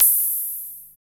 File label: FX DRM, Analog; Sound & Vision - Gigapack II